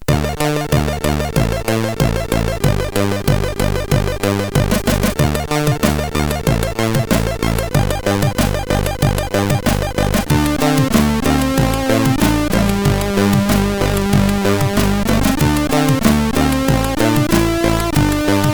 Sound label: Intro sid CSG8580R5